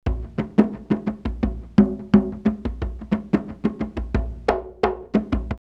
Lilongwe 88, drumloops World Ethnic; X-Static Goldmine

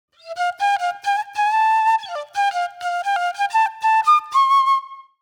Shrill Flute

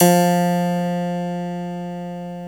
HARPSICHORD F2; Greytsounds

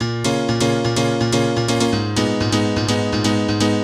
House Piano (125BPM), retro house

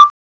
CLAVES; CMI Sound Library Rev 1.3

